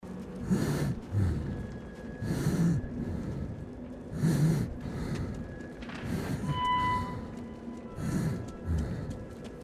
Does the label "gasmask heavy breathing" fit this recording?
Yes